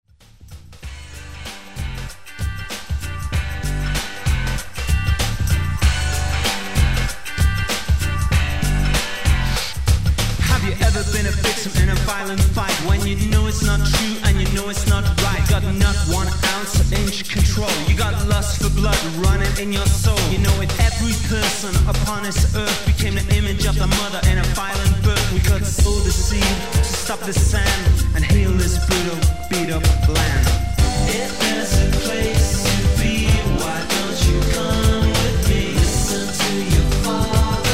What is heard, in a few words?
electronic